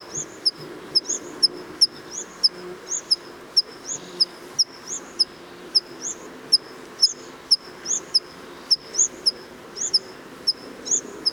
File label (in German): Bergpieper; Alpspitze Garmisch; Michle Peron